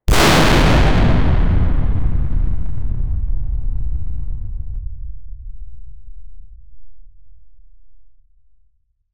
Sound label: a highly charged cinemati, electricity